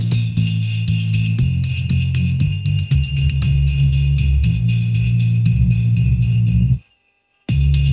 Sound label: happy jingle; sound effects